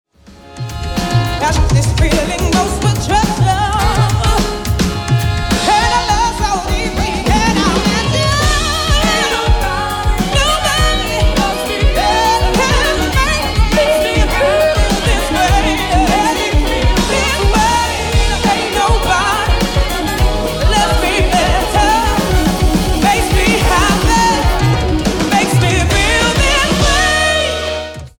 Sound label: Soul Band; Surrey